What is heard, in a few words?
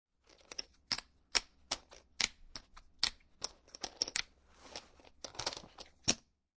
Poker Chips Collecting From Table; Poker, gambling, chip; Chips clicking